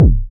original kick drum single hit d key

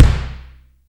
long tail reverb kick drum sample c key

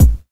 00s treble rich rap bass drum a sharp key

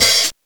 hihat f key; drum single hits one shots; hat samples

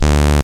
square; analog synth

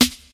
smooth steel snare drum b key

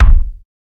old school hot bass drum a sharp key; kick drum samples

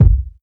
clear bass drum one shot g key; kick drum samples

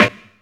clean snare g key; snare drum samples; drum single hits one shots

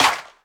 clap single shot c sharp key; single hits one shots

